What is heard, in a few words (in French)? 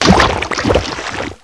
boue